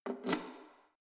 letterbox metal insert key hard

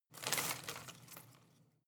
home plant leaves rustle regular short

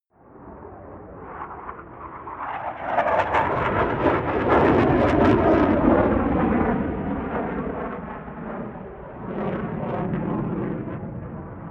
air strike

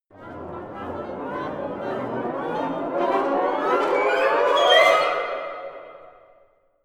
orchestra chaos

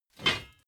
plate armor pick up

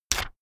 notepad sheet tear off fast

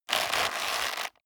leather creak short hard

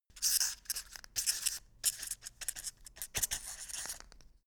quill write

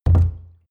canister full put down

